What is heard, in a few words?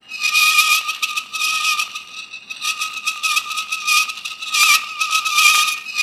ALLOY, MACHINES; Rarefaction; Poke in The Ear With A Sharp Stick